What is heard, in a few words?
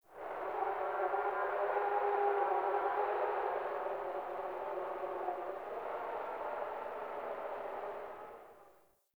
Wind Gust Howling Low, Venus Landscape; Warframe; Venus Open World; Ambience